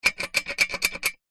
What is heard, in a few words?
metal fixing bracket lose slide movement; zapsplat; foley